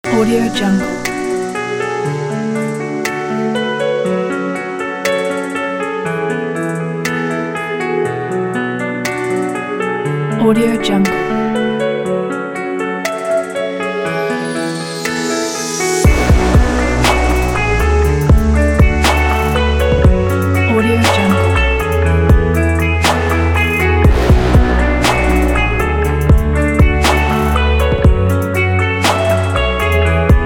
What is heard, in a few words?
peaceful